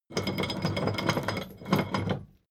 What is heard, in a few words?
drawbridge winch hoist erratic; maschinen; metall; soundpool